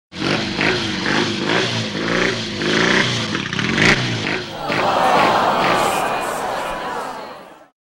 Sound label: wrong; tai lieu tieng anh 7 right on